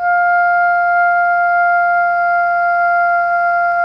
SOP PP F 4, Saxophones; Greytsounds; Philip Wolfe David Crozier's Saxophones

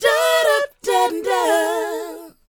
DOWOP B; ILIO; Vocal Planet

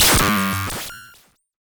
Cwejman S1; Jorkker; DevSnd Cwejman S1 - RD

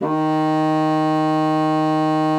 bassoon D#3; C BASSOON; Xsample VOL-6

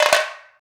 Repinique, Percussion; Roland BK-5

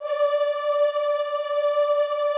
D4, Classical Choir; Best Service; Extended Classical Choir

